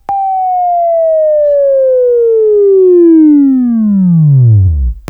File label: WAV Right, Sweeping; Sweeping Training program